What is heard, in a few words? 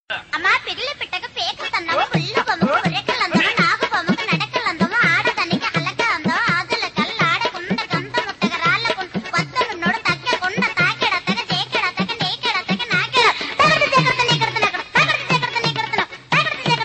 tamil pop; ringtones; hip-hop